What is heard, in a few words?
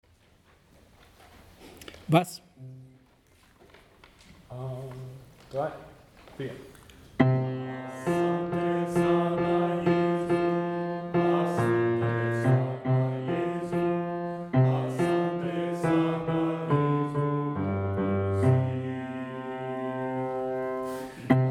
Bass; sante Sana Yesu